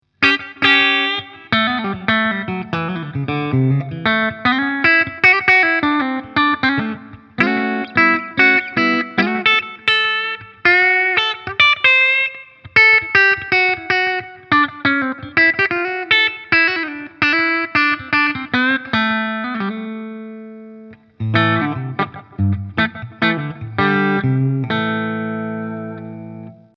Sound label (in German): steg clean